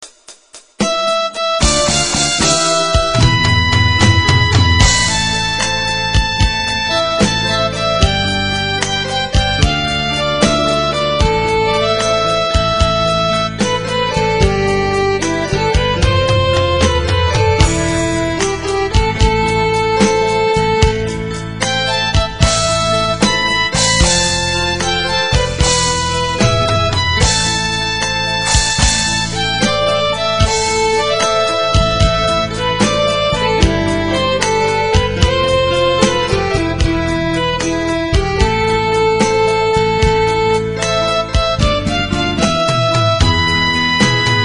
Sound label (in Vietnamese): Đất nước nhạc nền; nhac chuong; nhac khong loi